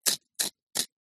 sharpening new pencil with small plastic sharpener